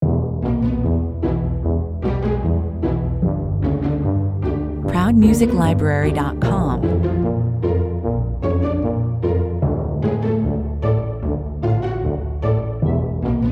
wirbelnd, unbeholfen

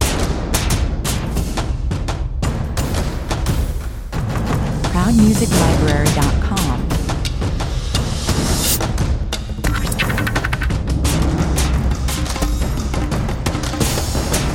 the great chase 174 bpm, Metallisches Trommelfeuer mit Wirrungen und Wendungen. Die Jagd ist eröffnet. Verfolgung pur; nur Perkussion und Drums

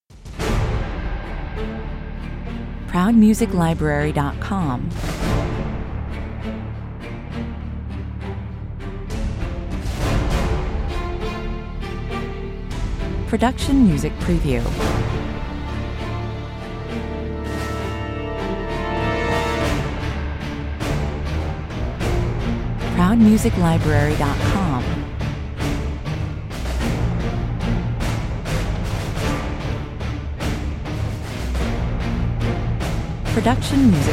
120 bpm, Spannende Hollywood-Sequenz; Es geht um die heroischen Taten Einzelner, um Selbstaufgabe und Selbstüberwindung. Klassisch gehalten

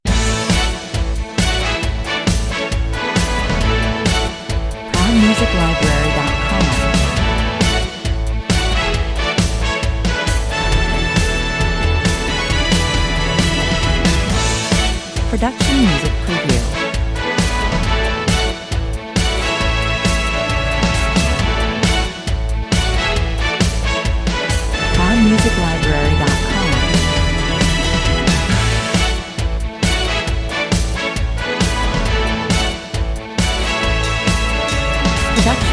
kurzer, knackiger Loop im 70erStil, 135 bpm

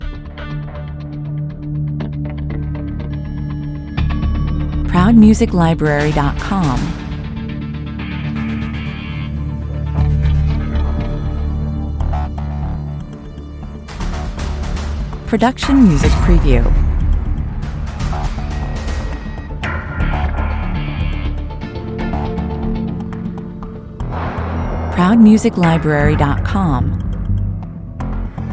Actiongeladene Filmmusik mit pulsierenden Bässen, Gitarren Effekten und zahlreichen Hits, 120bpm; Schnittfreudige Vibes perfekt für Cinematic Trailer

